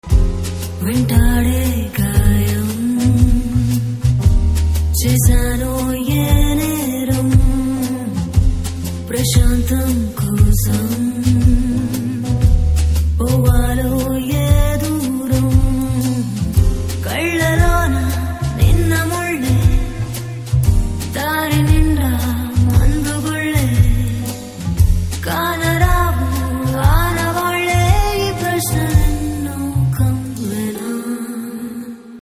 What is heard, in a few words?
Telugu Ringtone